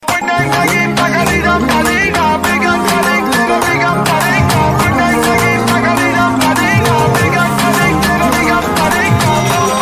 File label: Love Attitude Music